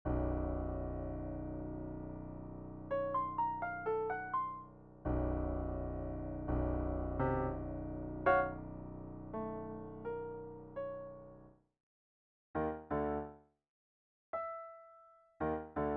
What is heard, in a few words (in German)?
Klavier